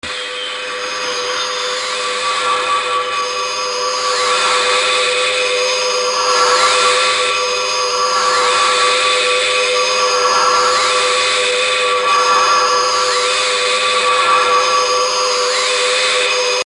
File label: ufo Green Light